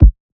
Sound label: kick under, Drum One Shots; SD MIDNIGHT Sample Pack; Slate